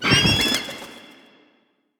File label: heroes birds Of Prey cast; broden